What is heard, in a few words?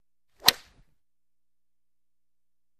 Golf Tee Off